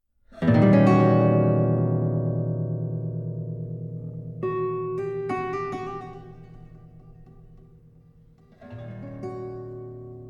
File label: Sonata for Guitar; Op.47 III. Canto